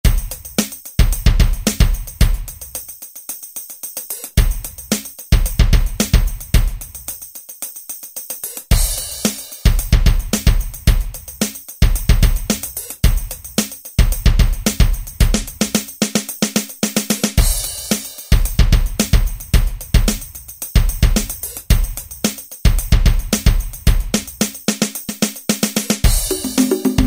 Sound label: groove, Alesis SR-16